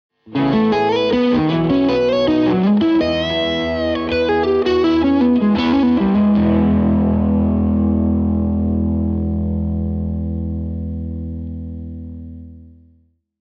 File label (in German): Klingelton